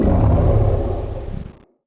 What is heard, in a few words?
Powerup Devil Horn Trigger